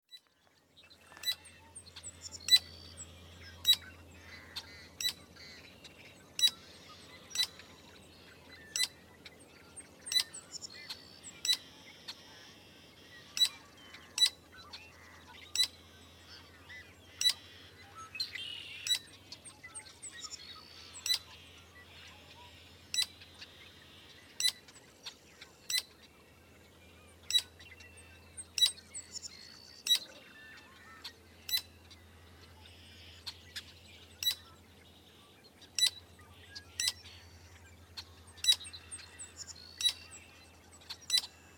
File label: blackbird train frog